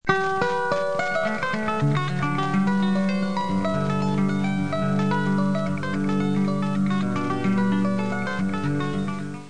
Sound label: gravikord